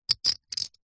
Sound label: leisure game plastic discs stacking; connect 4